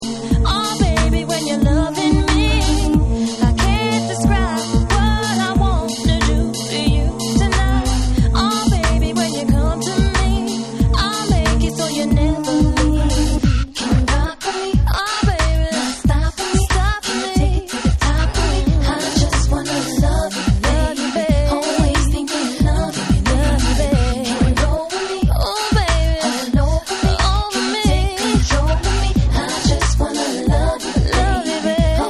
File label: Reggae Dub